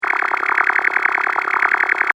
Space fx loop, Spaceship Environment Style Loop, Short; Sci-Fi